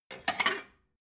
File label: plate cupboard take slide